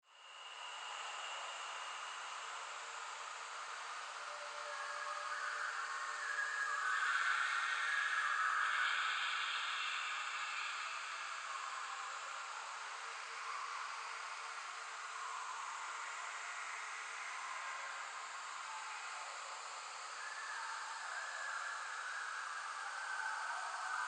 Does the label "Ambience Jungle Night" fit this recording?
Yes